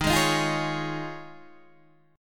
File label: Eb 7th Suspended 2nd Suspended 4th Eb, Guitar Open D